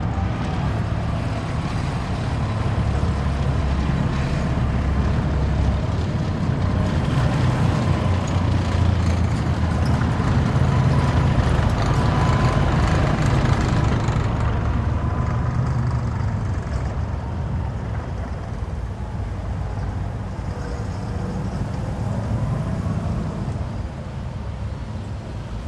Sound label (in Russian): tyazhelij tank leopard